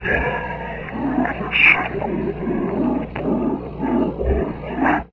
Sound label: boss sight; Quake